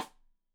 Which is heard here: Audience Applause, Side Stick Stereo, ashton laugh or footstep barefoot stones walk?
Side Stick Stereo